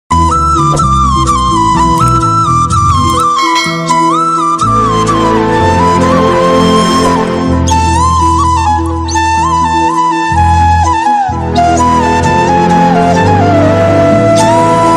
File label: flute bgm, love bgm; kanthara